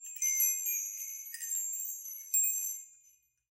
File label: Wind Chimes B; SoundsCrate